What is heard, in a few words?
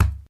wall, futebol